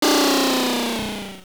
Boss Scream, Dungeon bosses screaming; LOZFDS

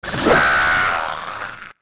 Die; Wizrobe dying; Mini-Bosses

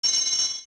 Shooting Gallery Bell

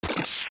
Steps Lava Land; zelda; OOT

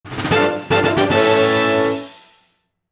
Fanfare Small Item; "Get Small Item" fanfare; zelda

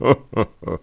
Chuckle; Lenzo chuckling; Zelda

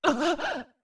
Gasp; Zora Link's gasps after a big jump

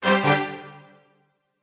Battle Strike

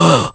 Ledge Near; Ganondorf nearly walking off a ledge

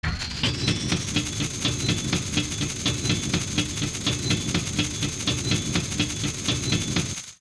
Drawbridge Up

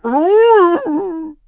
Thrown; A rolling Goron being thrown by Link

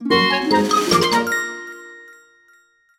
Fanfare; "Get Rental Item" fanfare (Game rip); zelda

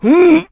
Sumo Escaped; Sumo match: Bo's grasp escaped by Link

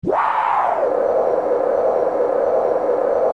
Blow; Freezzard; zelda; OOT

